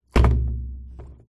musical drum tom set down

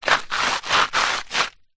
footstep scuff gravel dirt; foley

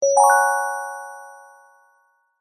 multimedia alert bell chime event notification

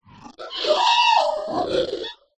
science fiction alien vocalisation

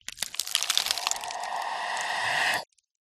Ice Spell Death Frost; sound spark

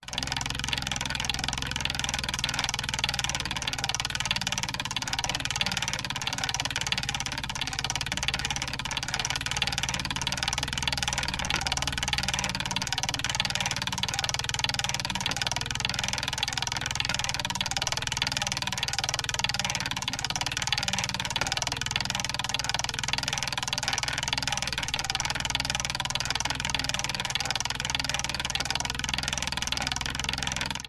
leisure wheel of fortune wooden spin constant

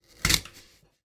knife slice into apple on plate

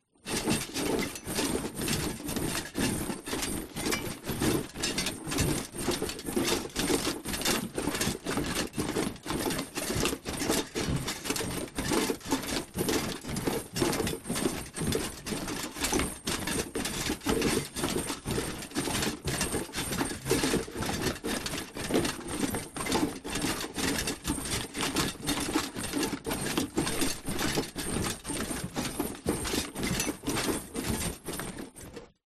ARMOR Troops Metal Clatter March; FOLEY; smartsound

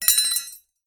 warfare knife down on hard ground

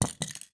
household key ring plastic drop on floor; zapsplat

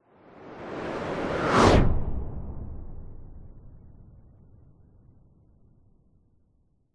cinematic whoosh ultra sonic close big long tail; sound design

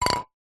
industrial can wd40 set down floor

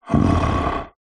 horror yeti monster groan happy content; zapsplat

